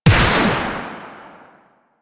Igniter Pistol Shot; Warframe; Weapons; Corpus

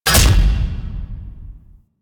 Attack Impacts; Ambience; Warframe; The War Within; Golden Maw

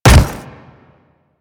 Step Default; Leto

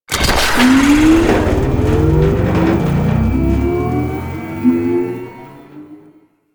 Skiff Engine Boost Slow, Vehicles; Warframe; Grineer Skiff Player Vehicle

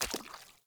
Mockery Walk Water, footsteps; Characters; Enemy; Mockeries

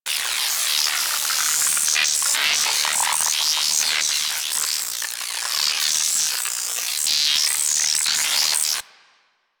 Inf Quanta Charged Loop, Weapons; Warframe; Infested

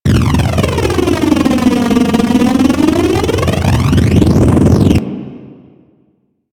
Space Drone Proj Loop, weapons; Grn Space Drone

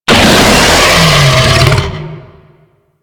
Infested Bone Blade Vocal Death C; Warframe

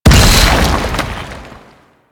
Heavy Hammer Overhead Slam; Warframe; Duviri; Dax; weapons

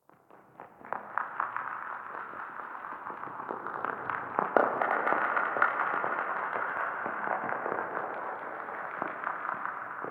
Rail Jack Exterior Earth Crackling; Ambience; Earth Region

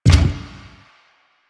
Gear Shift Down, Motorcycle; Motorcycle Ninety Nine

